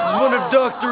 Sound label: sample, male laugh